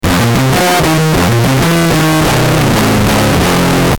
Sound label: muffs